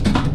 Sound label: brake, Train; EN57-815; OpenBVE